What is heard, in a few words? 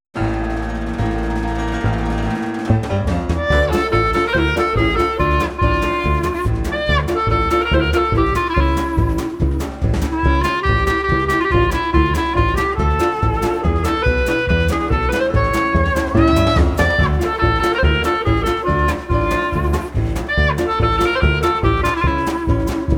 Hanukkah Lights Instrumental